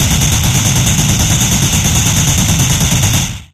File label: machinegun mono; Weapon-Explosion